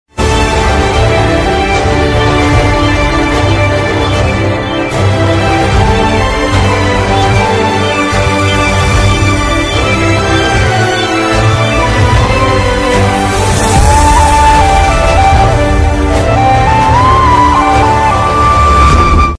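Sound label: love background music ringtone; shaakuntalam; samantha ruth prabhu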